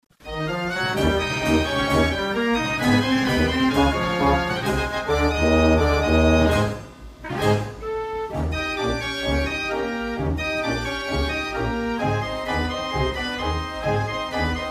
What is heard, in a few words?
BELGIAN, band organ